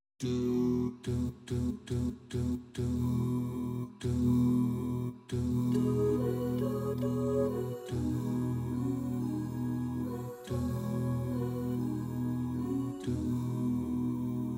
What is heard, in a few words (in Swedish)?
Bas